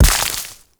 Mech Step Surface Foliage; Warframe; Nechro Mech; Step Surfaces

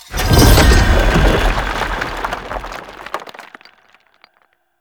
Vassal Castling Pull Wave; Characters; Enemy; Mendicant; MndKing; Abilities

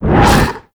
Rupture Cast; Warframe; Infestation

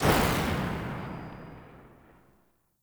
Arch Dual Pistol Tail; Warframe; Weapons; Archwing

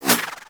Throwing Star Whizzby; Warframe; Bullet Impacts; Whizzbys

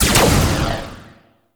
Shot; Warframe; Corpus; Spear Gun; BE Ferrox

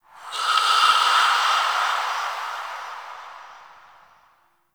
Zariman Whisper Air Draft

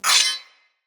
Melee Impact Metal

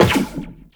Step Zipline, Footsteps, Walk; Warframe; Tenno

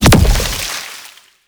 Impact; Gouache; Warframe; Follie

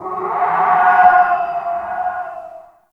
Distant Creature Shriek; Ambience; Warframe; The New War; Narmer Outpost

